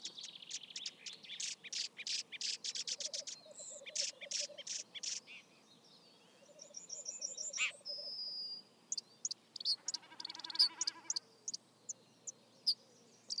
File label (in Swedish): Törnskata